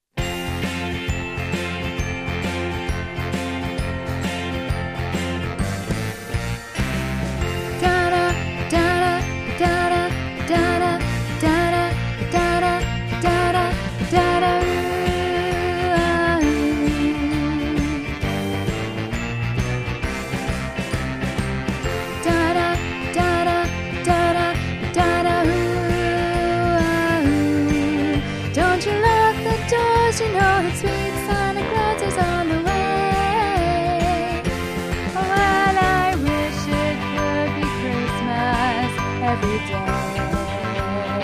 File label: tenor